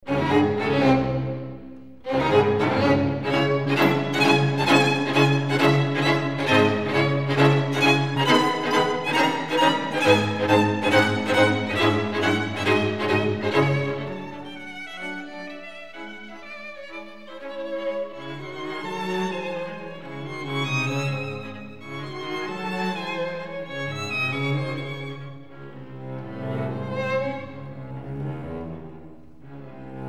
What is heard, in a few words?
String Quartet in C-Sharp Minor, Allegro; String Quartet No. 14; Op. 131; Ludwig van Beethoven; Beethoven - String Quartets Nos. 1-16